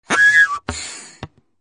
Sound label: Air compressor